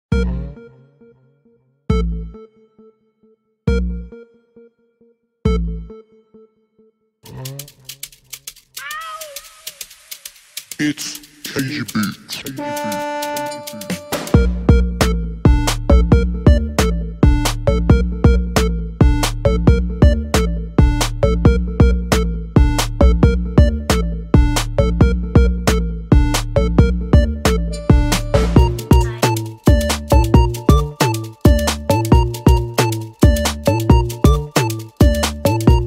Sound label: instrumental; Prod. KG Beatz